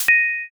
block clear

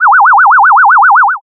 ufo

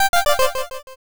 stairs down

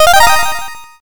level up

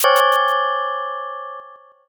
sting

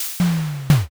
fridge

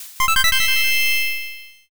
all clear

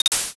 lighter

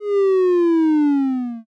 picosleepy

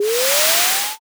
wind magic

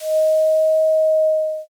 flute

